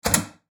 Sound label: Door Metal